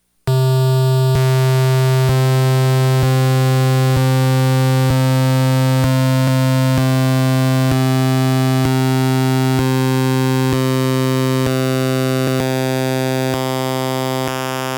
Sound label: wave channel pwm